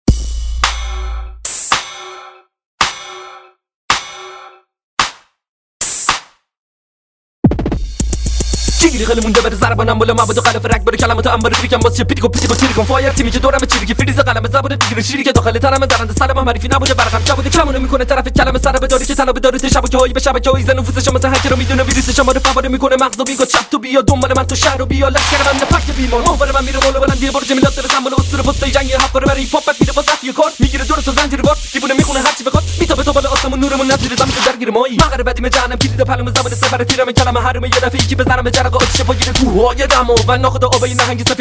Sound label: Acapella